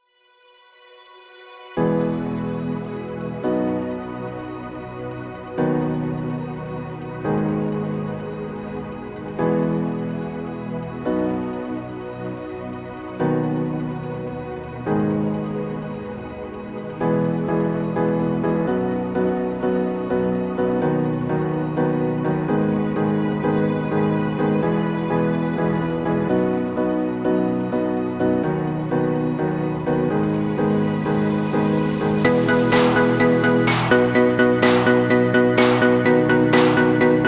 Progressive House

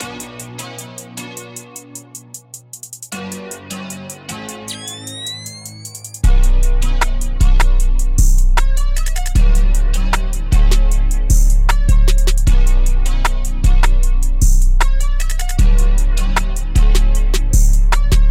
Instrumental

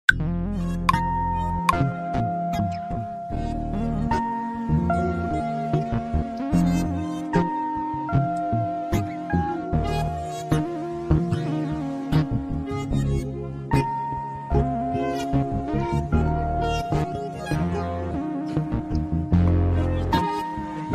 Instrumental

